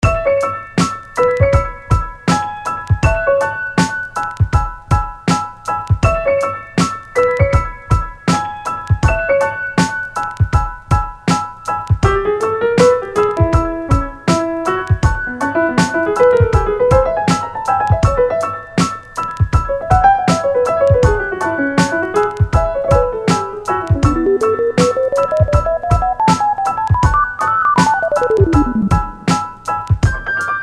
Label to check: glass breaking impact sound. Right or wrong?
Wrong